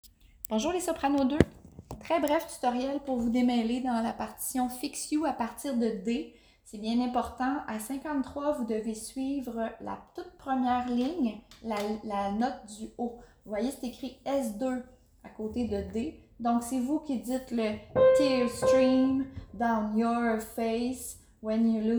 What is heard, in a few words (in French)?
Tutoriel partition Soprano